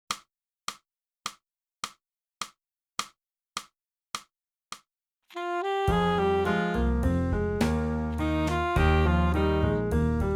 sax over the moon

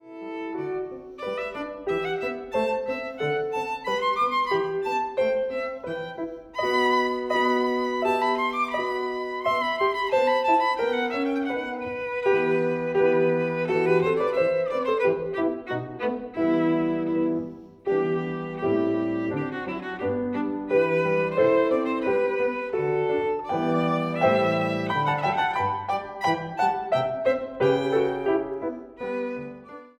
VIOLIN

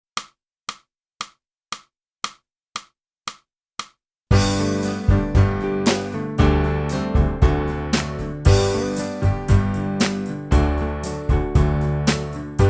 flute; Boosey Woodwind Method: Flute (Book 1)

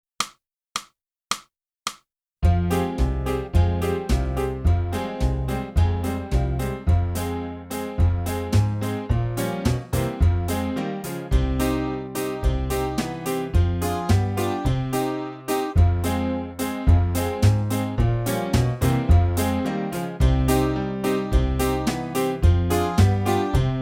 clarinet, on the waves of lake; (Morgan) - Boosey Woodwind Method: Clarinet (Book 2)

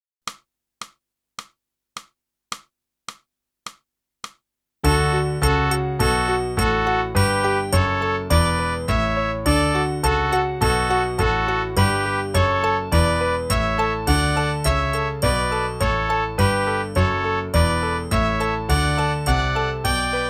clarinet; boosey method

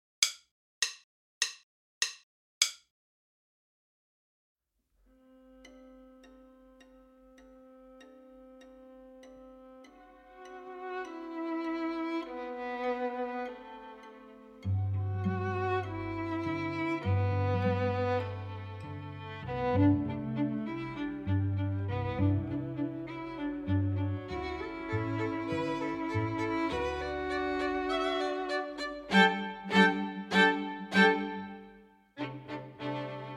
minus Viola

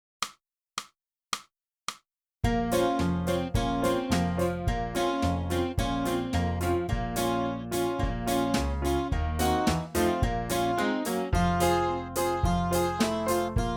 sax

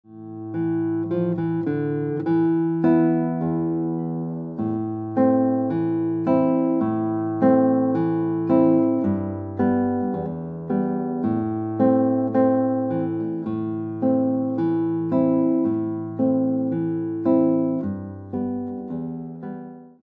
Guitar

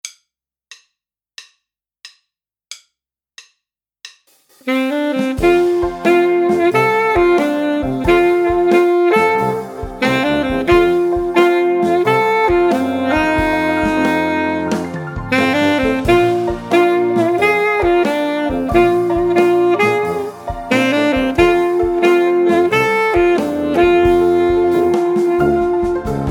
Dixieland Blues Full Sax

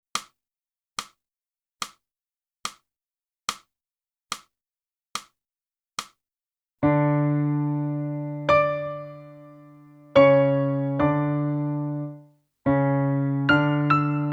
flute, vocalise alla valse

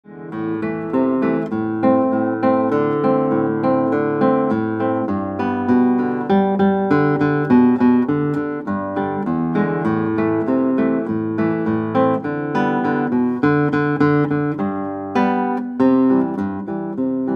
Guitar

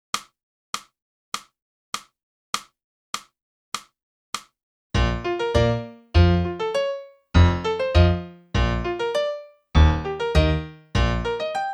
sax

